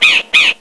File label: CROW CAW; ANIMALS